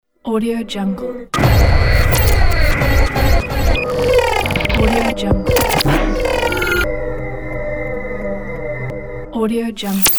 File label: glitch reveal transition; tmpz-0